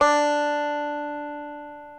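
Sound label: reference pitch banjo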